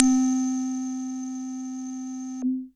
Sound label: SYNTH Bloomy Middle